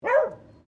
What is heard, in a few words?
ANIMAL DOG BEAGLE FEMALE BARK